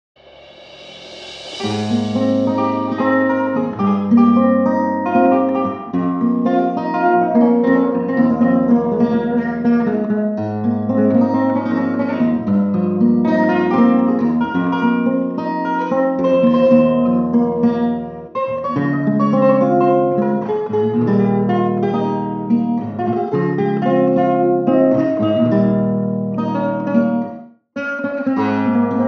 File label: inner peace instrumental violao